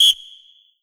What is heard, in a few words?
Yamaha TG100 Short Whistle